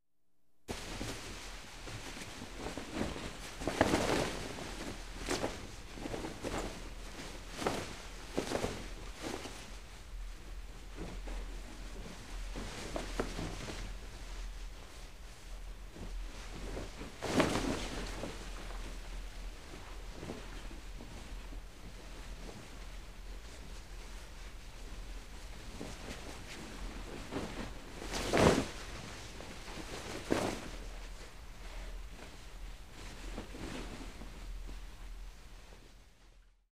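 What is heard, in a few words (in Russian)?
трение одежды ткани шорох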